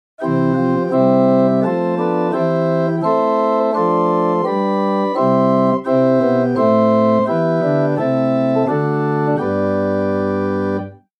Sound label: Holz 8 Prest 4, organ; Buzard Opus 3